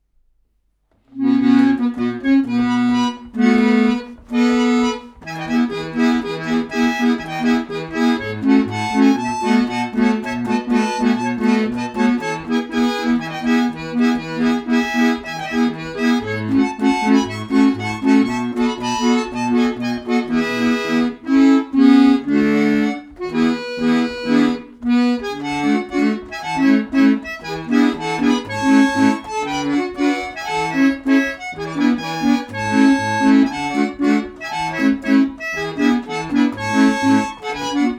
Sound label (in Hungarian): organette hangu; ariston; paurehrlich; lipcse; verkli